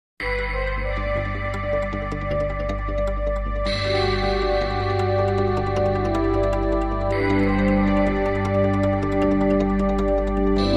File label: Fast High Pulse